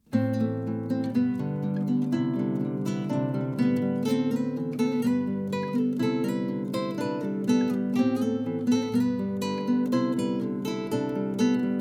Flamenco; Flamenco Fuego

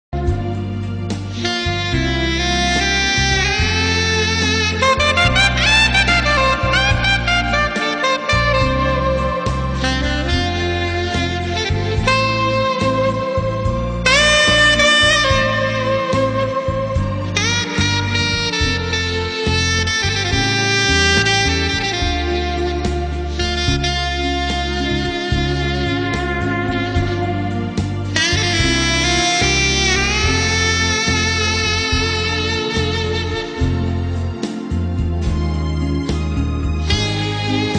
Saxophonist; International Saxophonist

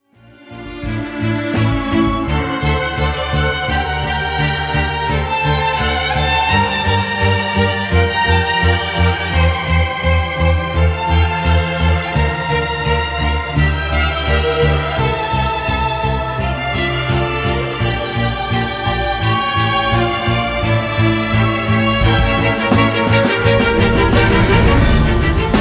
Electric String Quartet; Asteria

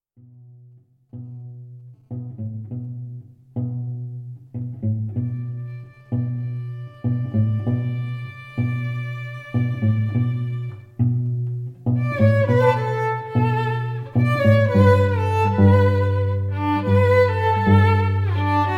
String Duo

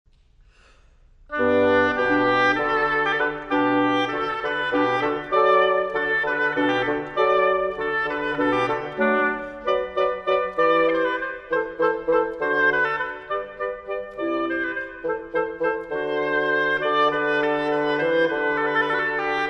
Trio

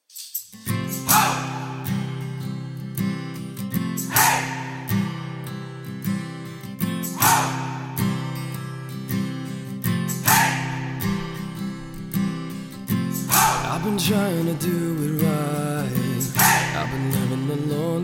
Electric Folk, Fantastic Modern 4 Piece Folk Band

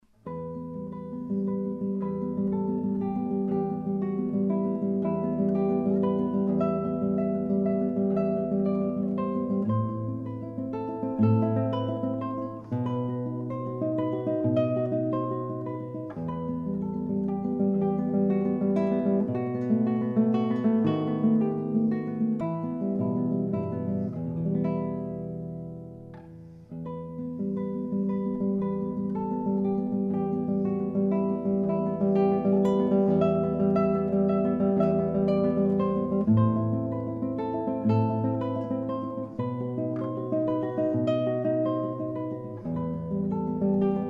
Solo Guitar romance

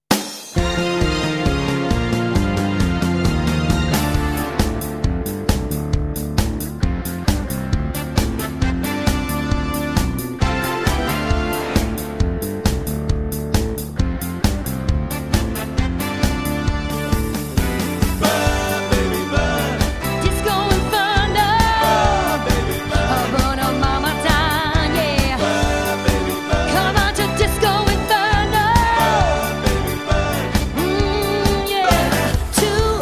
Female Singer

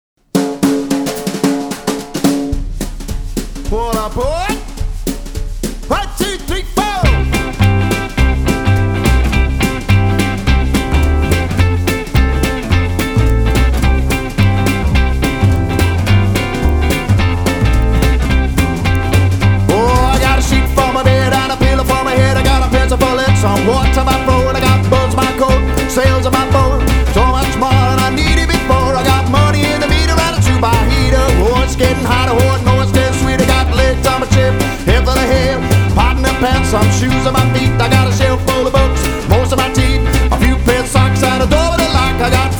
Swing and Jazz Jive

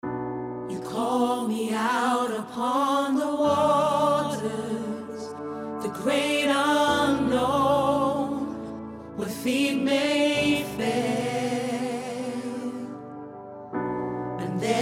Gospel; Great Gospel; Get Gospel

